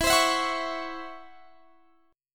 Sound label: Db Minor Major 7th Double Flat 5th